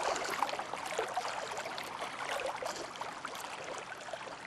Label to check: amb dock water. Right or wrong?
Right